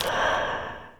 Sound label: zombie ghost footstep; ghostfury